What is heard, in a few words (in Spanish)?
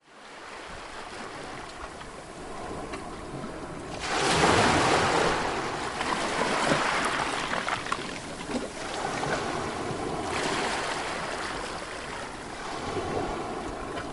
playa